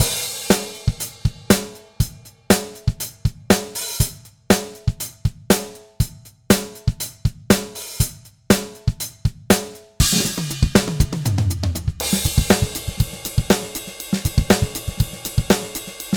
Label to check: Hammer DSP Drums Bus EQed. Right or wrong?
Right